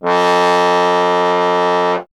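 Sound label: bass trombone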